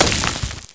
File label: rock selfcrush; Ferazel's Wand